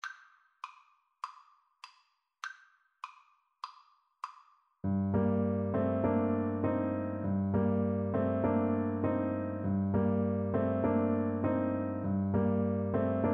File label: horn, French Horn